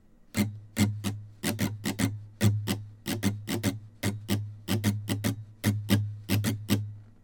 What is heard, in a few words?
jingle bell